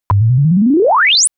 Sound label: bottom spk; xiaomi klee